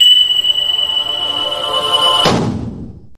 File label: taiko; taiko master; hibiki